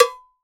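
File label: Mambo Cowbell Mute; Roland BK-5; Percussion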